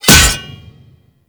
Axe Impact Default; Warframe

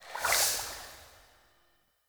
UI Colour Swap; Verminia

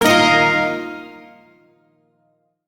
Shawzin Blues Chord; Warframe; Duviri

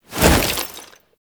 Gap Close Jump; Butcher; Warframe; Lasrian Soldiers; Foley; Stance

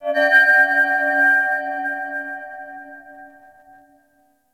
chimes; Ambience; Warframe; Orokin Moon

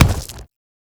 Arm; Warframe; ManInTheWall

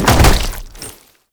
Land Heavy Flesh, movement; Warframe; Uriel

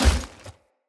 Heavy Grunt Boot Walk Veg, Footsteps; Enemy; Sinecure

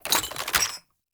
Reload Raise, Weapons; Warframe; Scaldra AK

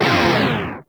Proj Small Fire; Warframe; Lizzie

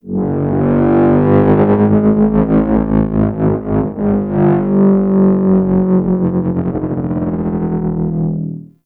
Terraforming Egg Vocal Dry; Warframe; Tau

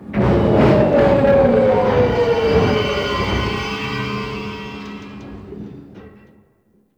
Crew Ship Decelerate, Engines; Warframe; RailJack; Grineer Crew Ship

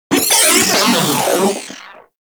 Moa Vocal Death; Warframe

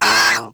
Kavat Vocal Pain; Warframe; Warframes; Khora

